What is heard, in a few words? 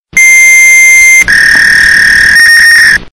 Modem Tones